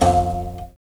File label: Mouth Bow, Africa; Roland LCDP11 Africa VOL-1